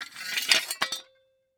Industry Tool Shovel Small Metal Shovel Scraping Cement